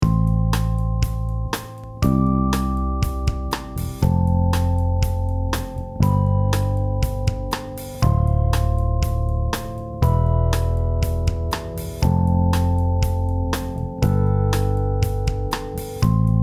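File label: Tone Thumb Mute, bass; Yamaha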